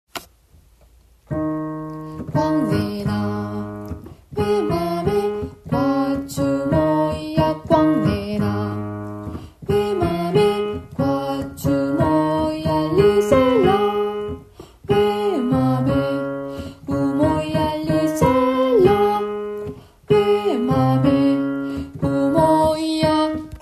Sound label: kwangena tina bo basse